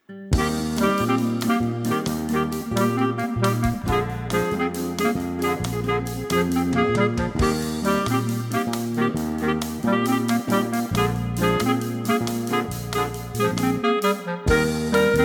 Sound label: clarinets with rhythm section